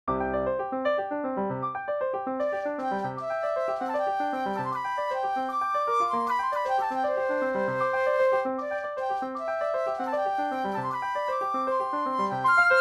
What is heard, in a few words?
midi flute